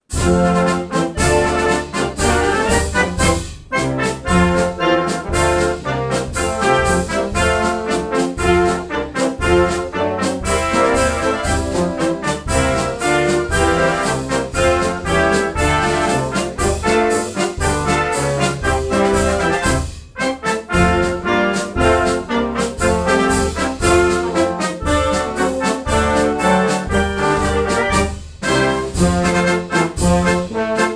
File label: Army Band; U.S. Army Band